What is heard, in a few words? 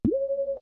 Sci Fi Door